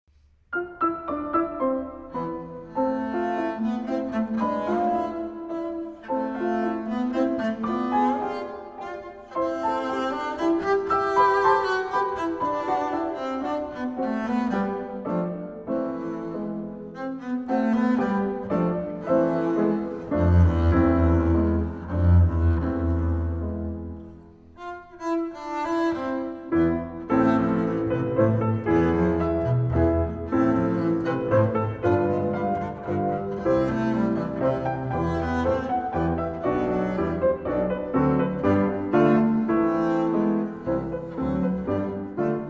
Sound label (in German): e-Moll, double bass; Brahms; double bass goes Brahms